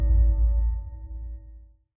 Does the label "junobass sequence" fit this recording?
No